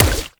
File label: Water Sprayer Punch Layer; Warframe; Weapons